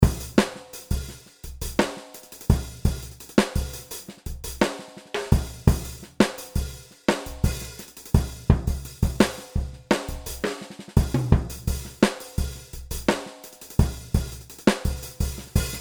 Groove Ludwig trocken

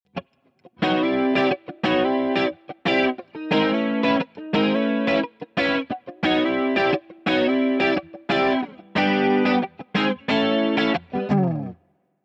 Boss DC 2 S 3 Stereo

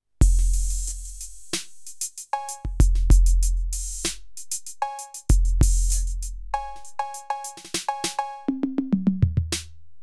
Roland TD07 909

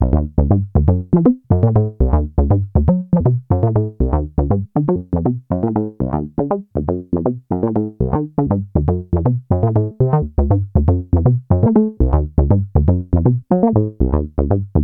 Dreadbox Chromatic Module 303ish

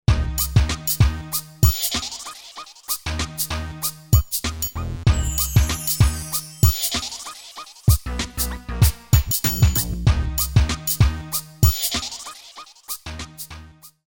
Hip Hop

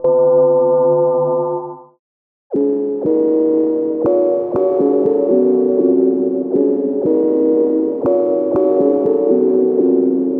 Hits From The Gong; Soniccharge Synplant 2

